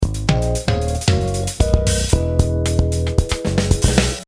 gate n comp

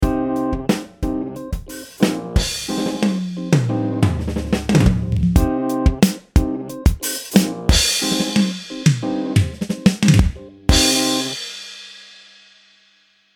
Studio Tight; MIO DSP

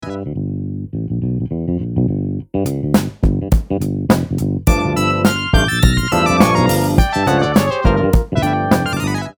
Funk

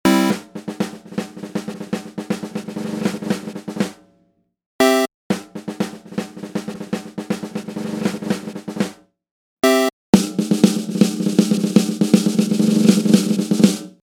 Snare Dry Gated

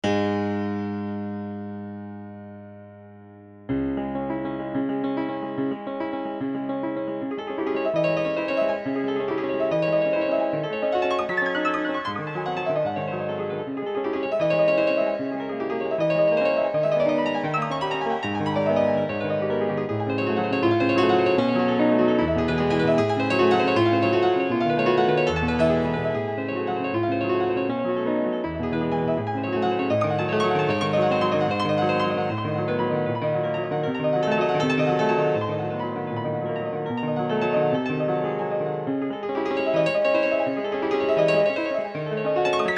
impromptu 432

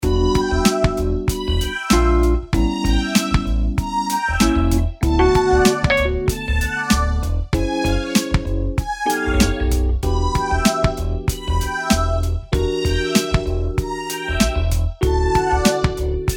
Solina minor major7

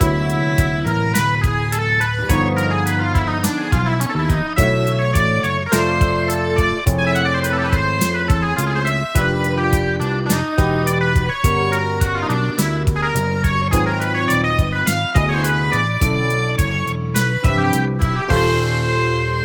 Deceptive Montage

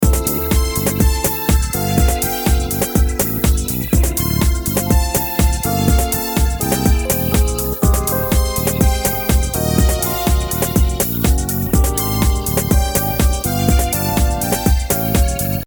Half Diminished

